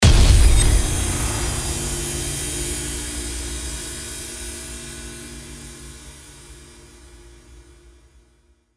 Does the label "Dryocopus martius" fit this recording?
No